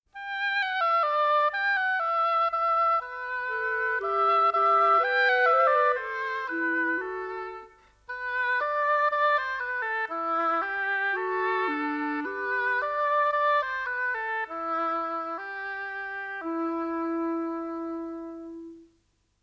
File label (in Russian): Пастухи играют на рожках; МУЗЫКА ДЛЯ СЛУШАНИЯ В ДЕТСКОМ САДУ (4-Й ГОД)